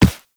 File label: Run Default, Footsteps; Warframe; Characters; Operator